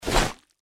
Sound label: feral ghoul swing; npc